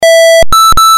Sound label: alert